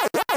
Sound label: waka; garrysmod